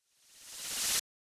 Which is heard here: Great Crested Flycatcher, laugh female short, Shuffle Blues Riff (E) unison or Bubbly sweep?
Bubbly sweep